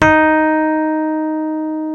ROADZ D#3, Suitcase E.P; E-MU Producer Series Vol. 2 – More Studio Essentials; Keyboards

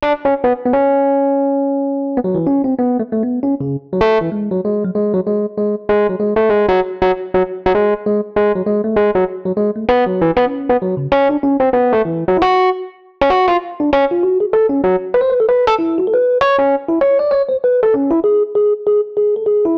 Rhodes Solo, Cinematic Funk; Sony Creative Software; Urban Tone Poems; Solos

